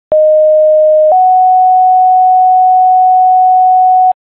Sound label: siren; clinton